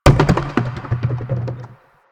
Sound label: Large Plastic Garbage Bin; Warframe; Ambience; Nineteen Ninety Nine